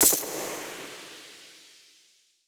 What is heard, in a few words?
chainmail; weapons; consume; dota; rattletrap